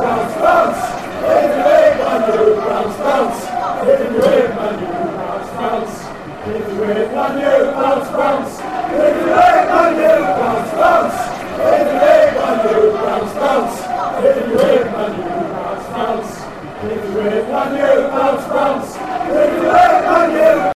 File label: bounce bounce, fanchants; lufc